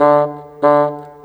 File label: Bassoon, Orchestral, Rock Pop